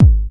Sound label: puffy kick one shot g sharp key, kick one shot tuned to the G# note